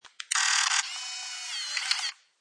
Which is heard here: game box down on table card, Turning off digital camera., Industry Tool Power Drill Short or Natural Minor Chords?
Turning off digital camera.